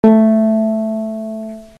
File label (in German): Akustikgitarre, Ton a